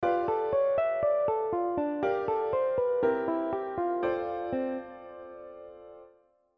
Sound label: chord tone improv altered